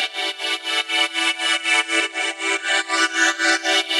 Pad 120 E, sidechained samples 120bpm

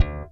P-B SLAP D3, BASS; Greytsounds; JAZZ+P BASS

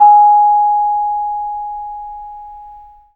BONANG AB5P; Spectrasonics; Heart of Asia

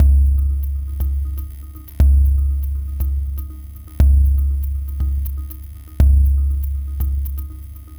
Glitched, Processed Percussion

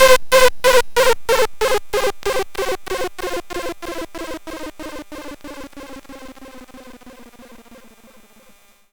C64 FX Falling SID

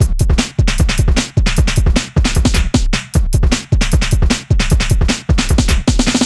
drums, Hardcore Breakbeat, 153 Bmin; Big Fish Audio; Hardcore Breakbeat Action

